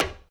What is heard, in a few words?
steel step; Golden Crayon Sounds